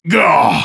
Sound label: hit